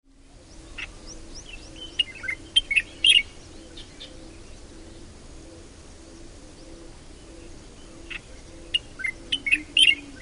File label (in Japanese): shirogashira call